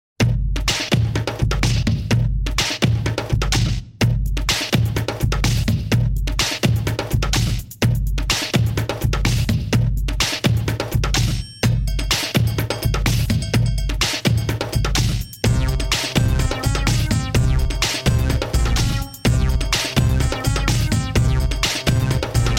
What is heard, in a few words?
electro effect